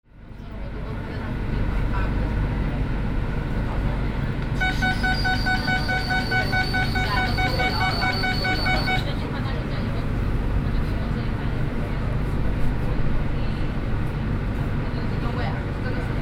subway signal noise

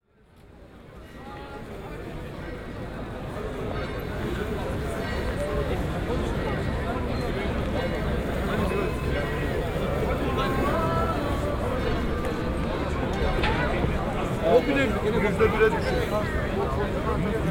istanbul istiklal bahn handynoise

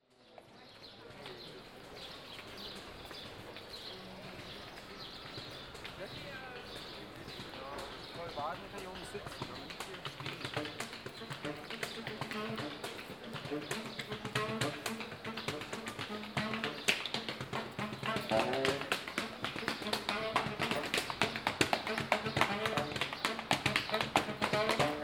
paris sax stepper

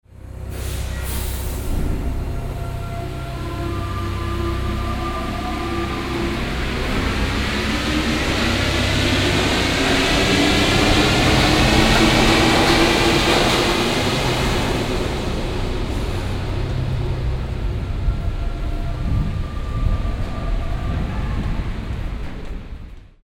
paris subway leaving

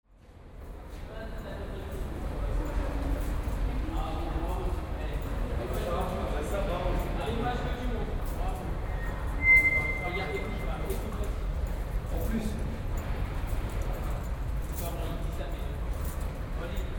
paris subway hall

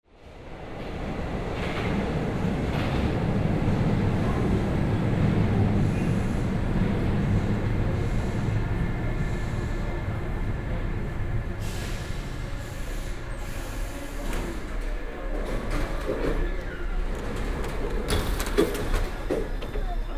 paris subway signals

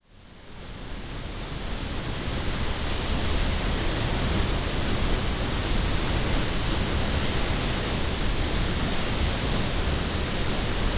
gluggafoss closeup

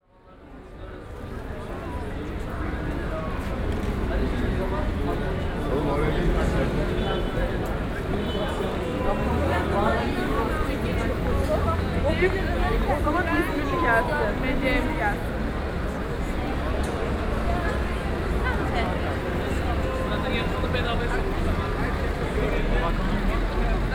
istanbul istiklal

